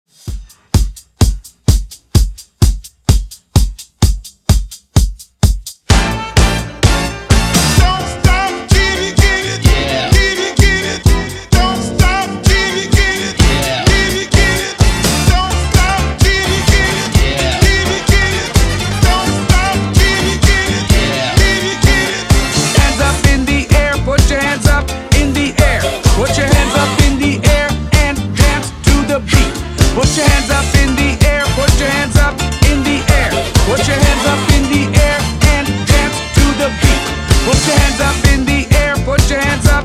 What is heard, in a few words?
Hype Intro Redrum 130Bpm